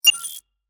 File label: submit